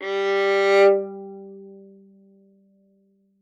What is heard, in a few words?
Violin arco ff sul G G3; MIS Pitches - 2014; Strings